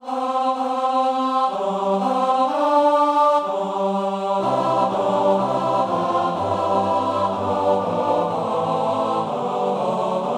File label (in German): Männerchor; Herbei o ihr Glaeubigen